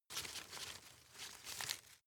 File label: grab rustle soft; berufe; foerster